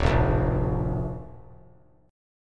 D Minor Sharp 5th D down; Dm#5 strummed; JGuitar

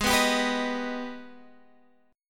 Ab Minor Major 7th, down, Guitar, Standard; AbmM7; strummed

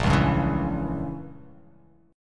A sharp Diminished 7th

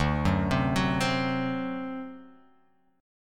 D 7th, down, Guitar, Open F; D7 arpeggiated; JGuitar

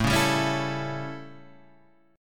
A 7th A, Guitar Drop D

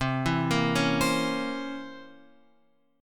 C 7th Flat 9th C Guitar Open F; C7b9 arpeggiated; JGuitar

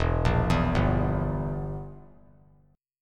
E Diminished down; Edim arpeggiated; JGuitar

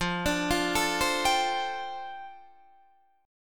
F 6th Add 9th F down Guitar Open D, chord

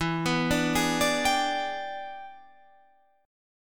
E 7th Sharp 9th, chord

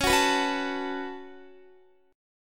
Db 7th Suspended 4th Sharp 5th Db down Guitar

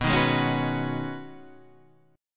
B 6th Add 9th B, chord; strummed

